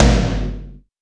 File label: TOM, Drums; Best Service; Real Mega Drums VOL-1